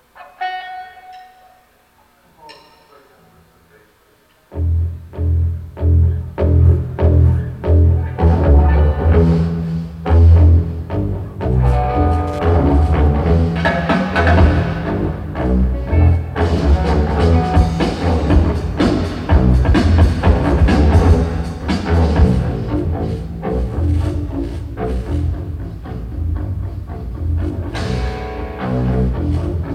Soundcheck, bass levels; Veterans Memorial Auditorium, Columbus

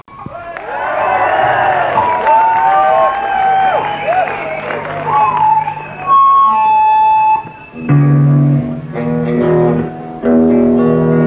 tuning

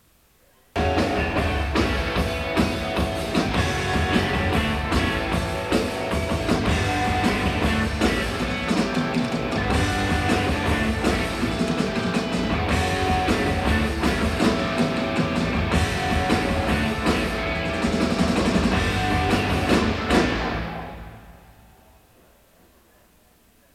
Soundcheck, tuning; Veterans Memorial Auditorium, Columbus

